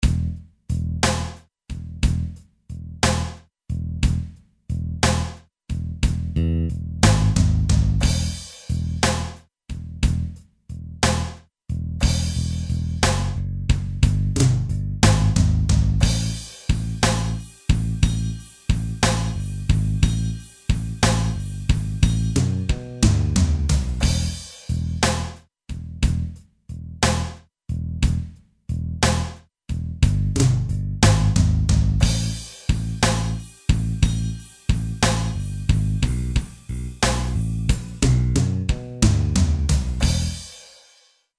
Blues; BOSDR670

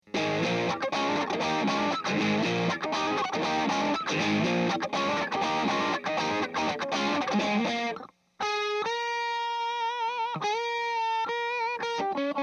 Eminence Man O War Speaker Overdrive

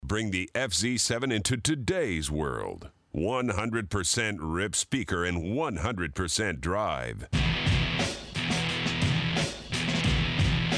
Metallic Heavy Riff

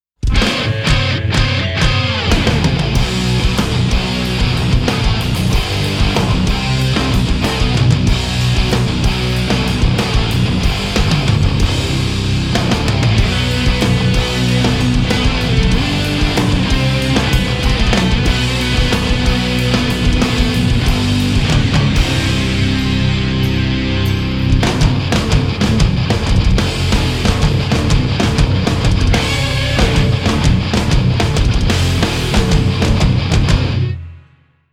Vox Valvetronix XL Damage Smack

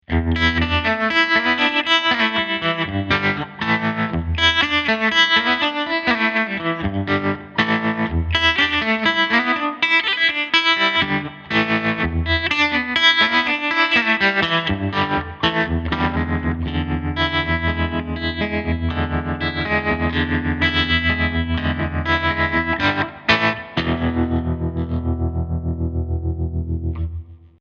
tremolo

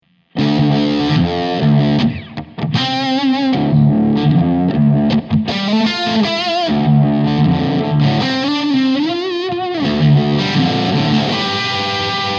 u-vibe

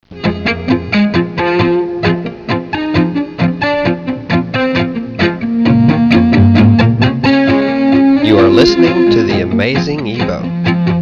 ebow

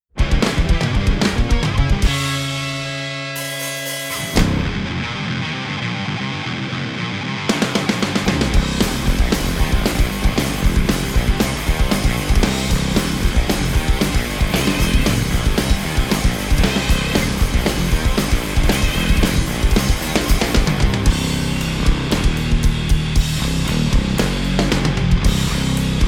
Vox Valvetronix XL Molten Zombie

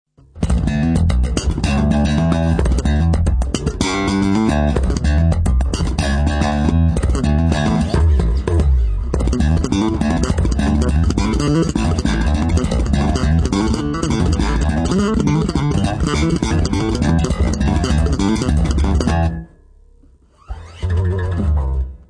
Pitch Mod

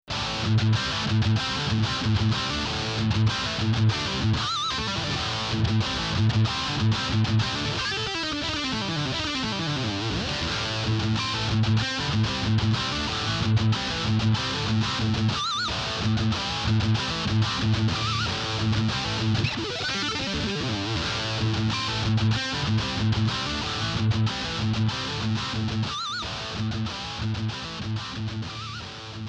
metal; vox ad15-30

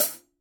hi-hat closed

